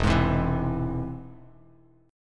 E Augmented, Bass Standard; E+ strummed; JGuitar

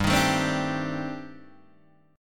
C Minor 6th Add 9th G Guitar Standard; Cm6add9/G strummed; JGuitar

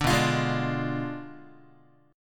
B Diminished 7th B down Guitar; Bdim7 strummed; 5,7,8,6,6,x

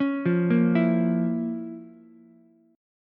F Major 7th Suspended 4th Sharp 5th, Ukulele; arpeggiated; 6,5,6,7; JGuitar

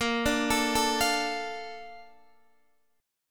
Bb Major 7th, Guitar, Open D

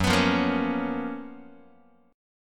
F sharp Major 11th, down, Guitar; x,2,2,3,2,0; 4A,4E,5A,5D,5Gsharp,6Csharp; strummed

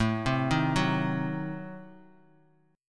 A Diminished 7th A down Bass Standard; Adim7 arpeggiated; JGuitar

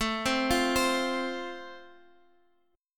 A Minor Sharp 5th A, Guitar, Standard; Am#5 arpeggiated; x,x,7,5,6,8; JGuitar

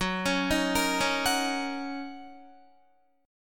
Fsharp 6th down Guitar; F#6; arpeggiated

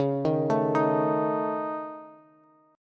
Db Suspended 2nd Sharp 5th, Bass; arpeggiated

